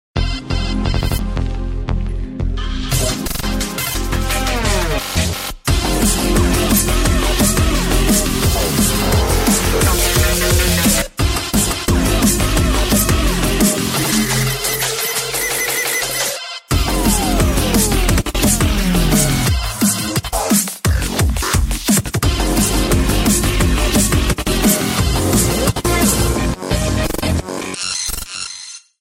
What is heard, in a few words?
nokia new ringtone; Category Nokia Ri